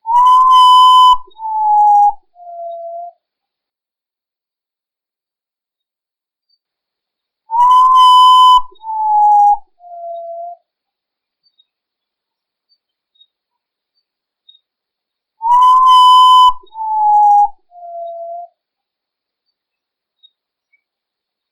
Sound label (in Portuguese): canto do Urutau